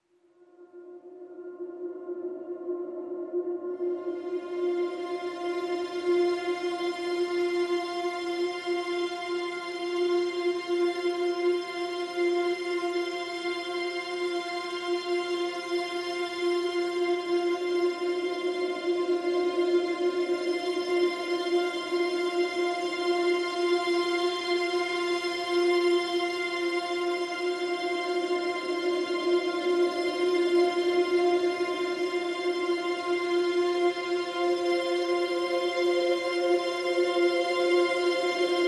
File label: Pads Ghostly Whispers (F)